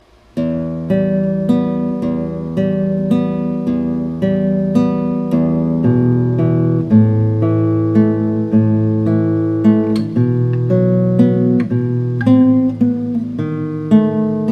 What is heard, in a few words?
Tremol